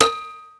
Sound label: pole hit